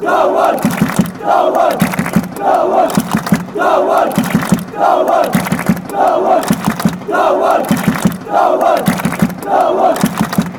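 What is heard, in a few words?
fanchants, football chants; dawan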